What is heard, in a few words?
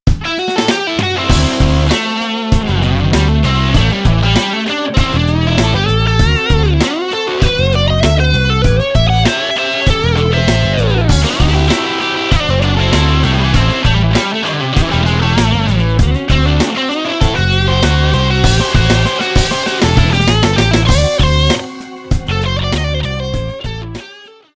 patch demo